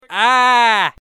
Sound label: screams